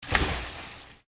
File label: element water; maoudamashii